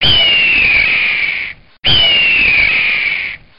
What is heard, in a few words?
Raven Bird Sounds Car Horn